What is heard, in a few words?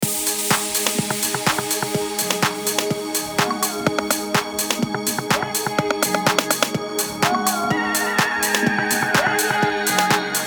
Ayaya 125 Gm Full Mix